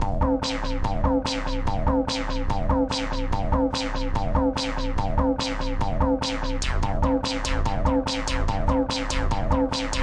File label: turnaround percs; wobble techno